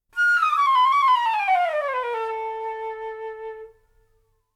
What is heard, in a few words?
flute fall